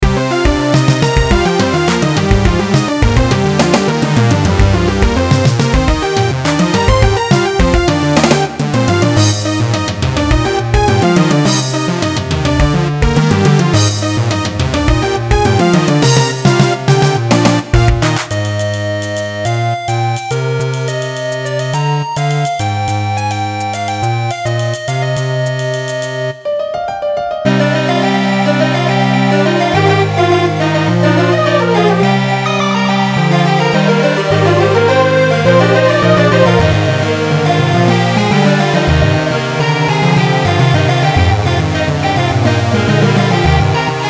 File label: digital oscillo